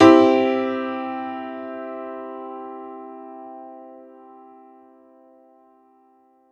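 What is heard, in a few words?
Piano Upright major hard; TrashLibrary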